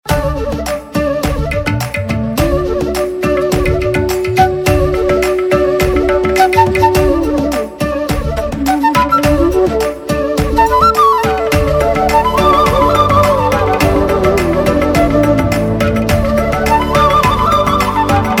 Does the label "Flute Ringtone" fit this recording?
Yes